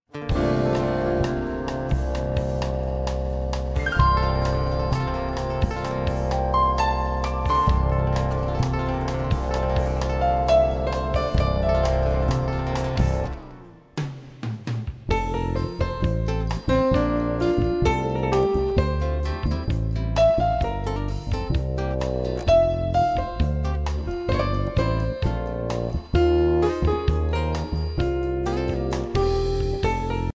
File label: holiday, christmas jam